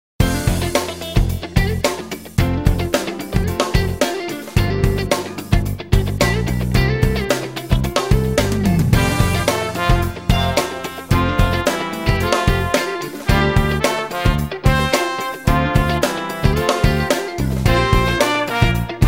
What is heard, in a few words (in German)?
trompetentaxi